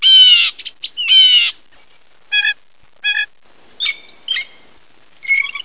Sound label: bluejay